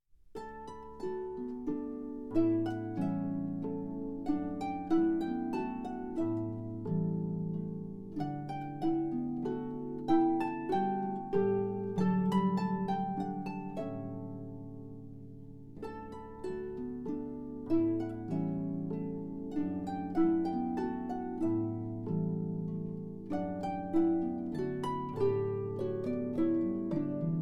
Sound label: harp